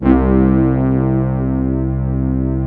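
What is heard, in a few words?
mellow section C2; MELLOWSCT; Synth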